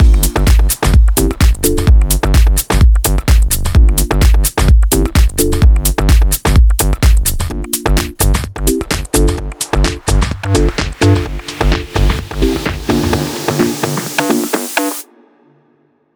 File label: 128BPM Mix; Vengeance Samples; Tall Anan Kit